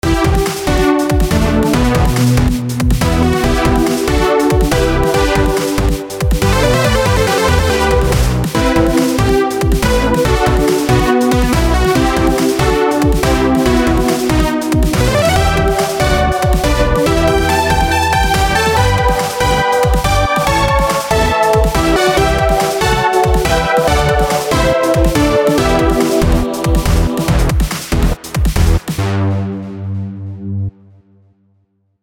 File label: ringtone